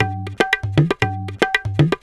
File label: Tabla, Roland BK-5; Loops & Phrases